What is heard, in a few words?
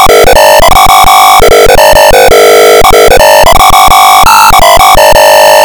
tune; backtoskool